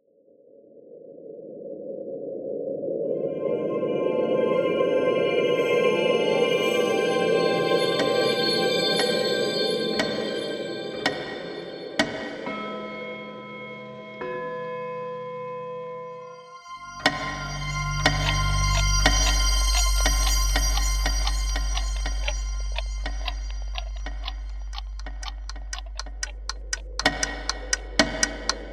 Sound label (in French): ambiance paris abstraite